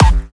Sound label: Hard kick